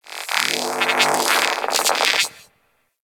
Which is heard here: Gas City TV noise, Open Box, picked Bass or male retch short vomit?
Gas City TV noise